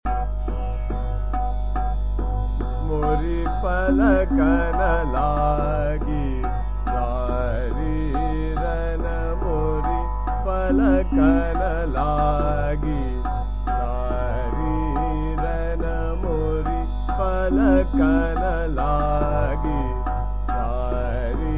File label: Chota Khyal - Trital, Jog; Mori palkan Laagi Sari Rain